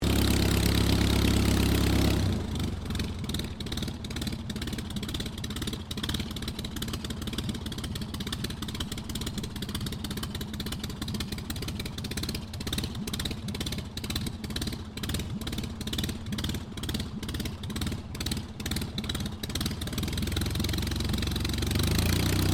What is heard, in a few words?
AIRCRAFT DH82A DEHAVILAND TIGERMOTH TAXI; RAAF Tigermoth; Airplane Sound Effects; Vehicles